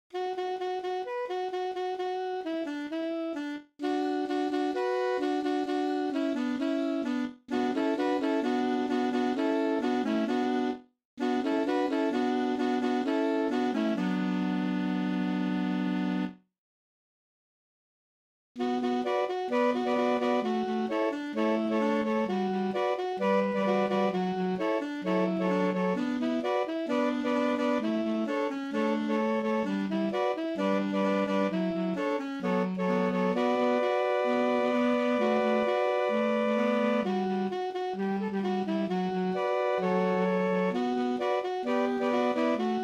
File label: Hanukkah Mashup